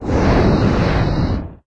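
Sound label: Hit long range fire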